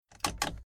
Transport Car Classic Dashboard Automatic Gear Lever Three Clicks; Bentley Eight